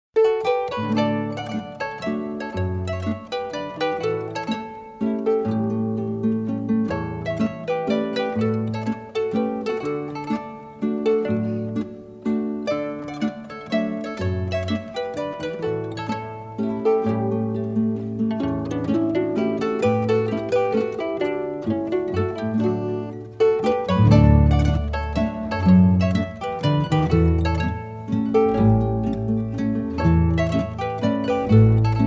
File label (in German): landler